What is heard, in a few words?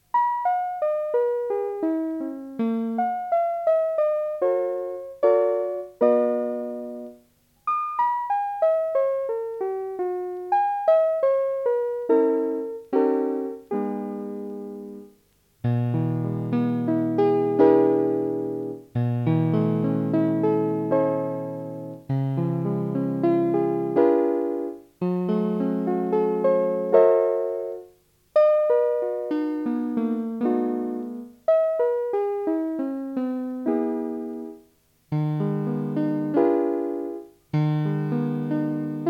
piano; Piezas para piano; Divertimento 1